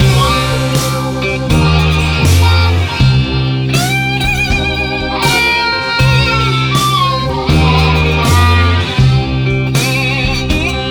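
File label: Treacherous Blues, 80 bpm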